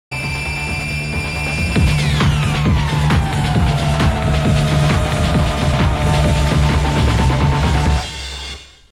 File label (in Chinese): 片头音乐